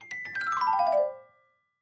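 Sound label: Clavichord Descending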